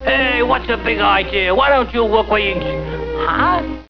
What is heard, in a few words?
death; quake2; fuddbeta